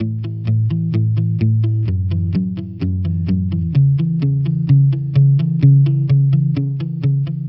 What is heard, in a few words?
Electric Pop Guitar 128BPM C#min; Electric Pop Guitars; Vengeance - Essential Clubsounds Vol.4; Guitarloops